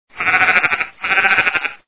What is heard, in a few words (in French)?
mouton